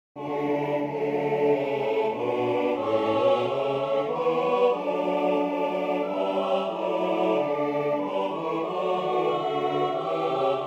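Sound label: SATB choir and congregation, The organ; The First Noel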